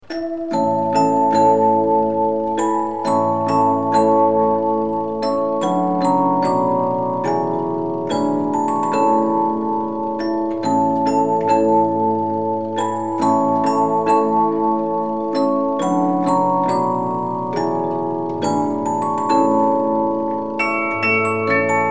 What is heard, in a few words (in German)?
spieluhren